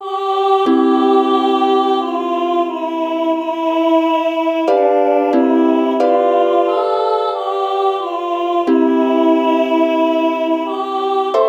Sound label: uke, ukulele